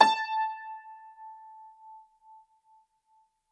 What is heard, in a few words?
Grand Piano, Kawai, Sustains, A4; Chordophones; Legacy